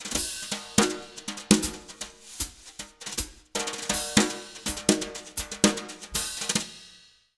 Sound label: Jazz Latin Drumloops; 160 CHABAD; USB Soundscan vol.08; AKAI